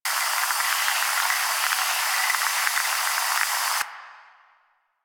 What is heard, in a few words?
Volatile Runner Spark Loop; Enemies; Abilities; Warframe; Proto Infested